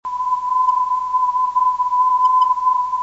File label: 408khz, ndb day time